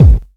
KICK, Big Beat; Big Beat Samples & More; AKAI

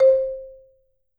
MMED F C4, Marimba; MRMBA SPLIT

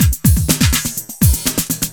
LOOP 124

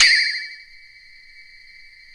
Fairlight CMI III Pole Hit, Drum Machines

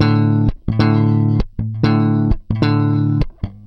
Groove Pluck, Bass; Construction Kit; Riffs, Patterns Licks; Bass X

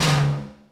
IND; Zero G; Man Machine

